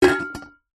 food drink bottle metal drink empty set down on surface